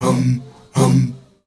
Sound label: MALE HUM; Spectrasonics; Heart of Africa